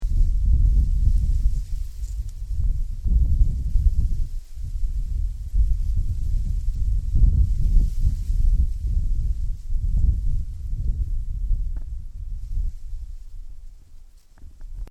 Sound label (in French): vent